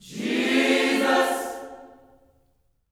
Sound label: GOSPEL CHORD, Vocal Planet; JESUS CHD